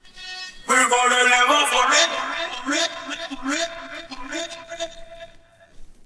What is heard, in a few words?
2nd dance vocals